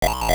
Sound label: Dungeon Switch; Hitting a dungeon switch - floor tile or crystal (also used when jumping on Goombas)